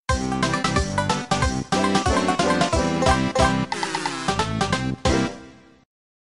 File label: collect jiggy long unused music; banjo kazooie